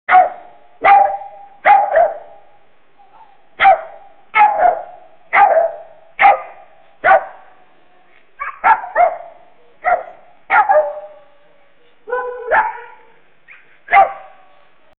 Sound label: dog barking i love you; domestic animal; domestic animals